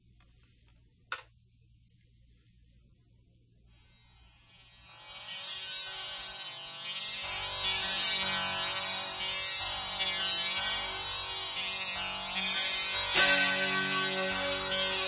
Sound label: Harp song